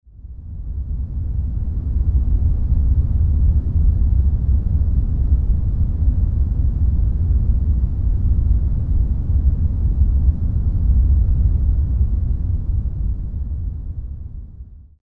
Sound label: Orange Noise Remastered, louder ambient sound